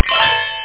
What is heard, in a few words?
get pick